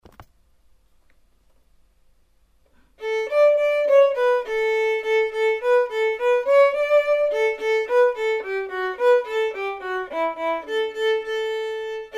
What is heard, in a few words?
vln perf